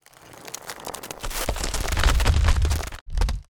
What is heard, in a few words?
AI Foley Ice Pressure